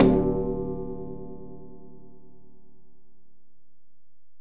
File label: Ac Perc, Drum Machines Alesis DM-PRO